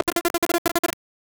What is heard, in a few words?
info grapic sound effect, beep